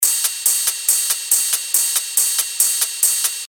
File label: techno loop 140 BPM; Spur; Revealed Techno Top Loops Vol. 9